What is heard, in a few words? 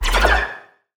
Collectibles Sci Fi FX; Cymatics; Video Game Sample Pack Vol 1